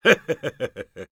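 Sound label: laugh evil, Spanish; Engineer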